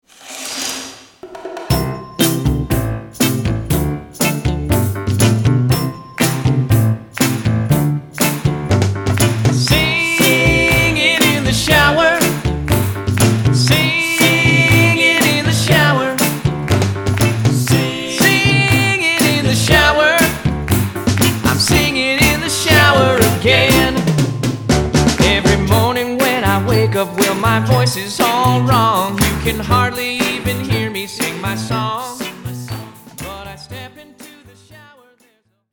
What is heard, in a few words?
Singing in the Shower